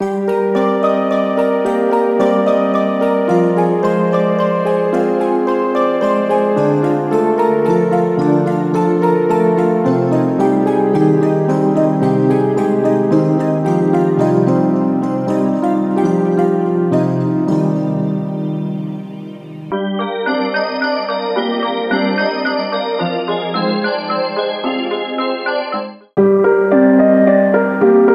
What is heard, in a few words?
Keys; Yangtze